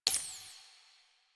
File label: Pistol Laser Aim Exit; Weapons; Warframe; Tenno; Lasrian